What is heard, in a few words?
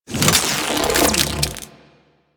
Tonfa Equip; Crp Tonfa